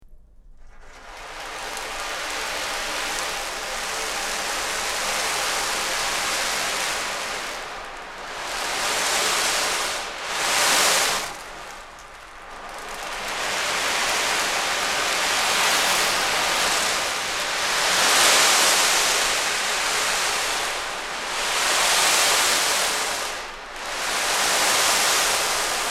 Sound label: Before Rain